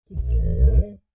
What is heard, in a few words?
Creature Large Moan Intrigued; Monsters; Horror; BigRoomSound